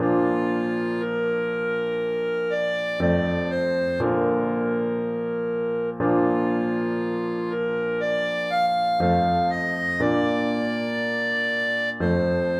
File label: clarinet concerto